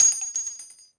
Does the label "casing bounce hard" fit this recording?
Yes